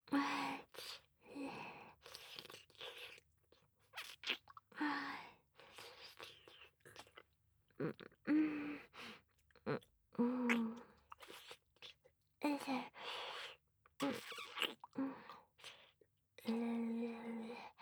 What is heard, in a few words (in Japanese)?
フェラ; アドリブ集